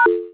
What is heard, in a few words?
message